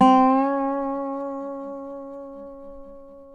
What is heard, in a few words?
SLIDE, Dobro Slides